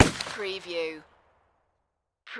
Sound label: Pistol Rhuger 44 Magnum Single Shot Exterior At 45ft